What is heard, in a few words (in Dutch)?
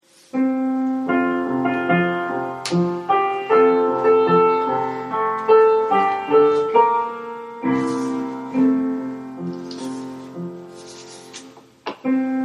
O Dennenboom pianobegeleiding; kerstconcert 2020